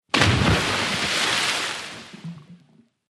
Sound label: Splash Hvy Boom Splash